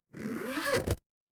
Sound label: paper fabric zipper